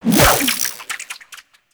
Fishing Spear Line Retract; Weapons; Venus Fishing Spears; Warframe

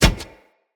Kubrow Step Metal, Footsteps

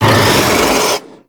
Brawler Rock Punch Cast; Warframe; Warframes

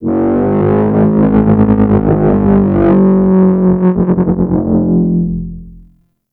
Terraforming Egg Vocal Dry; Warframe; Tau

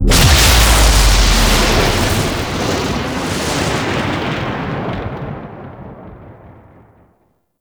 Void Tear Open

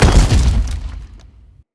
Blunt Heavy Impact Wood, Weapon Impacts; Player; Environment

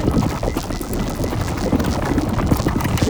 Wave Orb Loop

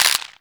Infested Sniper Rifle Mech; Weapons

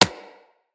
Bullet Impact Generic

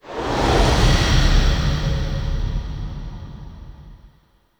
Amphitheatre Colour Stinger; Ambience; Warframe; Duviri

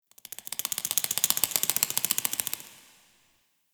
Interior Spider Crawling; Ambience; Warframe; Duviri; Murmur

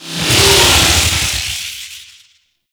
Tau Sentient Vessel Movement; Warframe

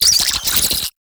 Brood Spider Vocal; Warframe; Oraxia

